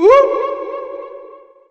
"woo"

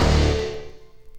yeech

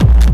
Kicks Electro

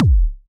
duck bill, Kicks Electro

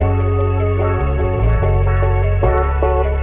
fuzzed out, Electronic Loops

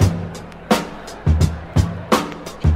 Drum loops Boombap

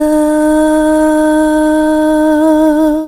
girly, Voice

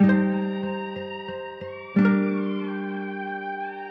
guitar, Jazzmatazz loops

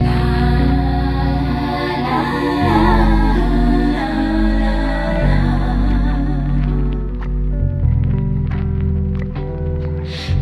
keys and voice jazzy, sad loops

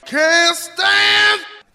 can't stand SUNG

